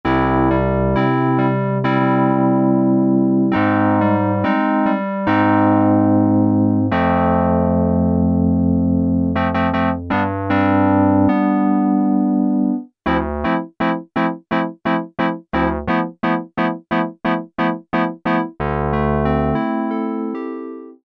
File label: Korg microKORG Reed Piano; Factory Voices